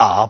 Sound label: LoFi Vocals Oneshots; Vengeance Samples